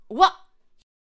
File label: Attack Vocals